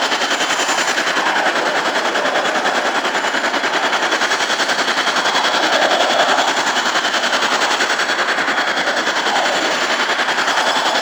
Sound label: Movement Slow Engine Loop; Warframe; Vehicles; Archwing Demolition